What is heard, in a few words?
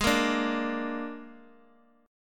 G sharp Suspended 2nd Flat 5th G sharp Guitar Open D; x,x,x,2,1,0